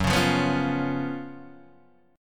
F sharp Major 7th Suspended 4th; F#M7sus4 Chord {2 4 3 4 2 1} strummed

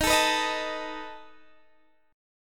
B 7th Eb

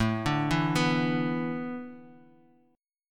A Suspended 2nd Suspended 4th, chord

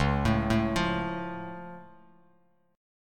C sharp Augmented down Bass Standard, chord; arpeggiated; JGuitar

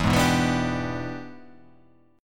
E 7th Sharp 9th, chord

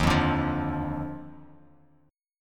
D sharp Major 7th Suspended 2nd, Bass Standard; strummed; JGuitar

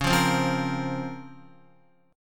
Db Major 13th, Guitar; strummed; JGuitar

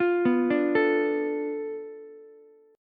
F 6th Flat 5th F Ukulele; F6b5 arpeggiated

